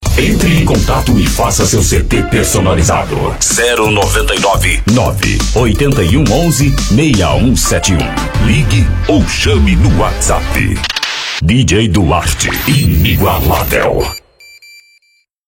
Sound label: Esp Reggae